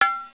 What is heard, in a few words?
Missile; invader1